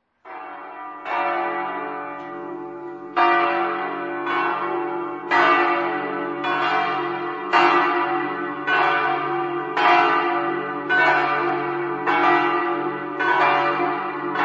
campolongo, campane

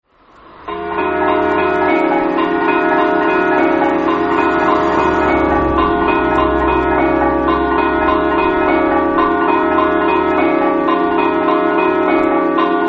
liessa, campane

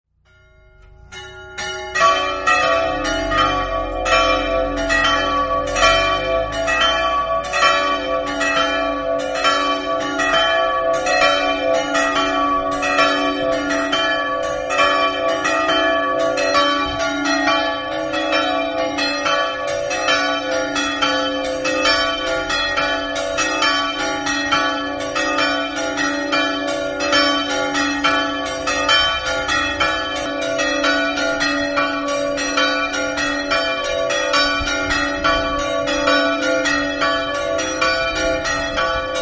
muggia, campane

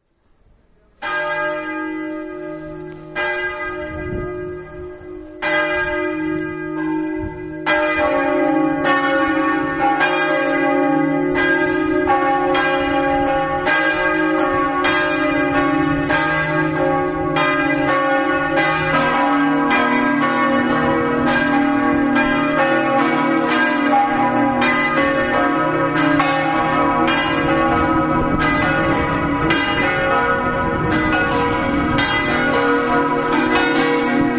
gruagno, campane

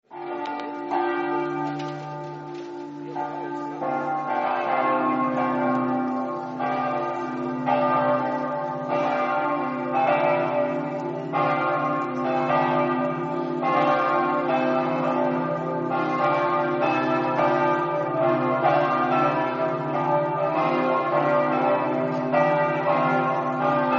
svito, campane

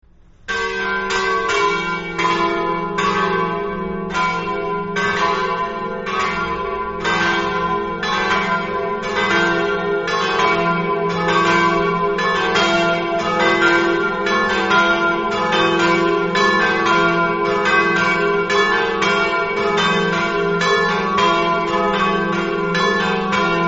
palma, campane

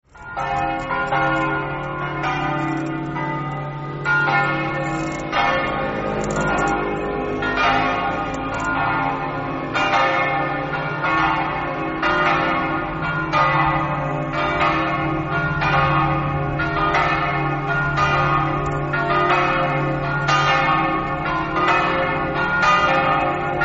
povoletto, campane

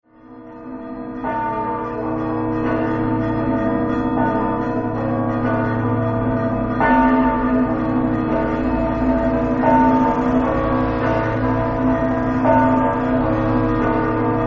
mortegliano, campane

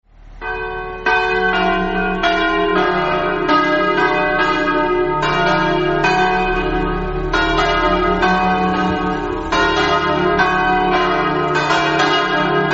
precenicco, campane

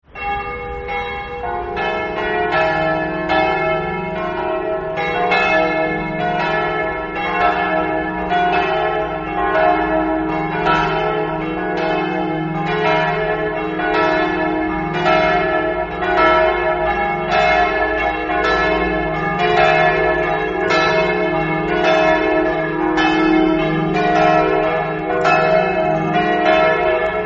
san gottardo, campane

